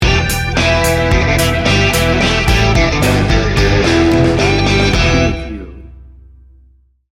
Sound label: big rig run sting bumper